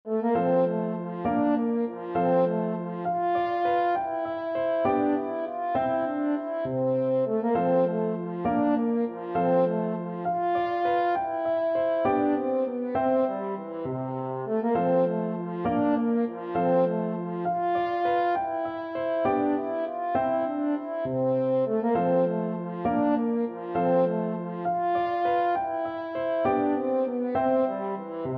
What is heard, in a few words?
Gigue G Major, french horn